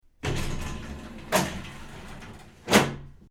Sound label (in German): Duschkabine schließen